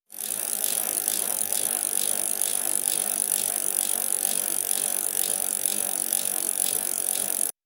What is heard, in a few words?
bicycles